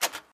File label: button Click; steamtower